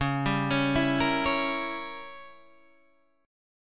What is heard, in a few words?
Db Diminished 7th Db down Guitar Half Step Down